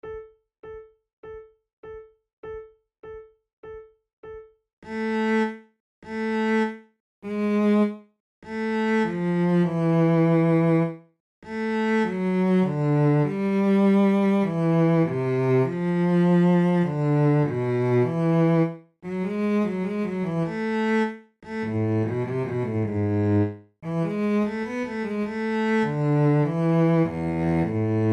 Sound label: recorder sonata in a minor allegro; marcello op2 no10 iv